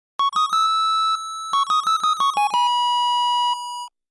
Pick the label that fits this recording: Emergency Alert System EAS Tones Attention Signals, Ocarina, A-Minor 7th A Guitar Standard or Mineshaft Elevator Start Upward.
Ocarina